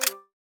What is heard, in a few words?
buy item failed; Counter Strike Model Pack; CS:GO; survival